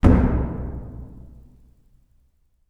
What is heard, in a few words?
Wooden Door Slam; 99Sounds; The Warehouse